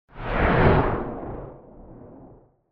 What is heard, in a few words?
Whoosh Deep Wind; Hollywood Edge; sound designer's toolkit v2